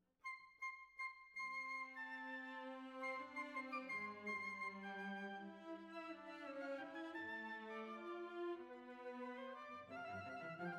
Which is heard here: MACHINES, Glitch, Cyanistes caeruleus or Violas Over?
Violas Over